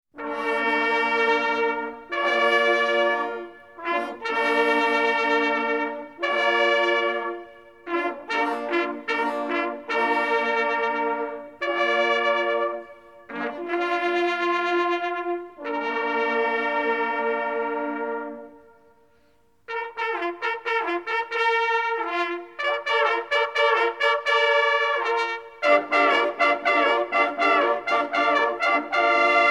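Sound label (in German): Jagd vorbei Halali, Jagdhornbläser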